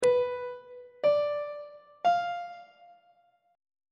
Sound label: lydian chord 4 is b diminished on treble clef